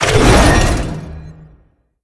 activate; Smite; Tiamat

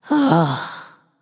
sigh, voicepack; smite; jorm

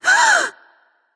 breath light; smite; voicepack; hel

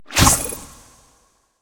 cocoon initiate; arachne; smite; arachne skin9

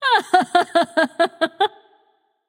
laugh light; smite; voicepack; hel

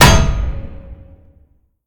knockback; smite; lancelot

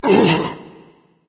ouch berserker; cuchulainn

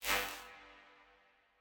footstep trail; smite; khepri

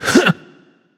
grunt jump; smite; arachne

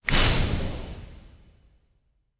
idle finger snap; smite; olorun

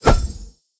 swing; Smite; Tiamat

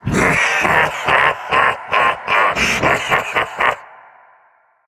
laugh; Smite; cabrakan

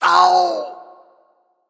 ouch

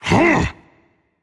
confused rage; chaac

